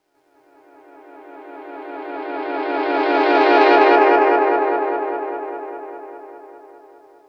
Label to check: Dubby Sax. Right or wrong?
Right